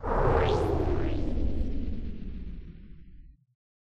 shield off; Alawar; Star Defender 4

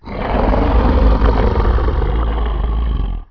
worm roar; Emperor - Battle for Dune

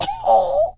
choke, survivor voice; Left 4 Dead 2

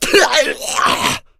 smoker pain, voice; Left 4 Dead 2

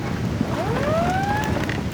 light cruiser attack damaged; Hearts of Iron IV Death or Dishonor

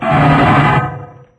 metal groan, materials; Garry's Mod; hl2

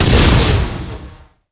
cannon; minotaurus; Emperor - Battle for Dune

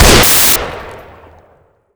sniper military fire; weapons, gunfire

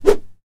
throw stone; Mount&Blade Warband

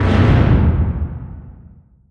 distance ship hit small effect; Hearts of Iron IV Death or Dishonor

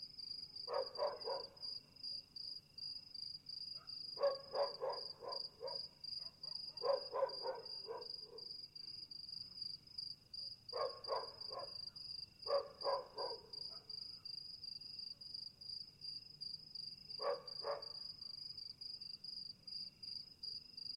night dog; ambience; AssaultCube

